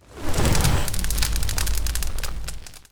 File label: pick n click scene mansion fire